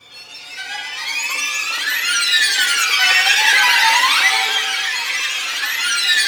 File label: STR FX, HORROR; East West - Global Sounds